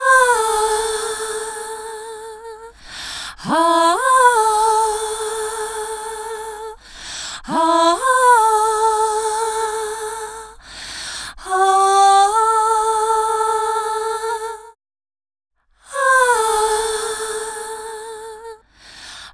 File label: Harmony Ah Vocals Am 120Bpm; Construction Kits; Ghosthack; SkyRealm; Kit 05 - Heart Of Fire